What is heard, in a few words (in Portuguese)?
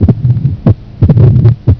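estenose mitral sopro mesodiastólico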